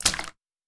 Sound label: Puddle